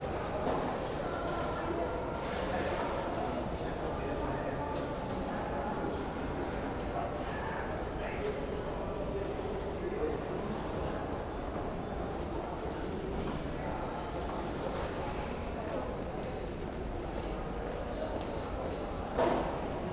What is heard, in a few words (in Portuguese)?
Ambiencia anexo 4 camara dos deputados hall com pessoas; e carrinho de compras; colecao sonora do cerrado